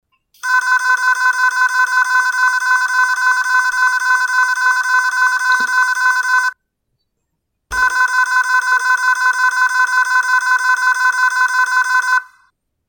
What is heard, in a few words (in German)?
Intenso USB Festplatte Samsung ST1750LM000 startet nicht